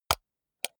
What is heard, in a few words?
computer mouse wheel click slow hard